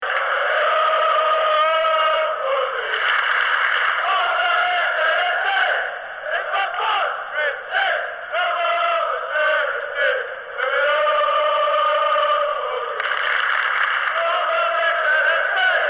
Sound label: and we all love sporting